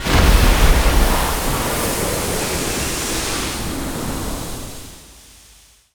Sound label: cryo huge wall machine; Quake 4 Alpha